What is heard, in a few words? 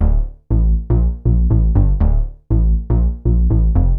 BASS, DANCE; MAGIX